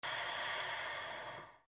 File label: taking breath